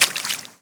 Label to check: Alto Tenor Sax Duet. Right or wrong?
Wrong